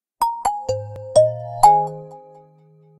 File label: power on sound, Nokia 2760 Flip phone tones